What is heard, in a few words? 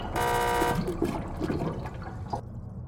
failed skill check; dandys world